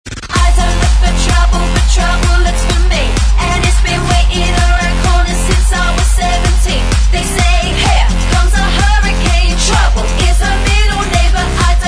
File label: backing vox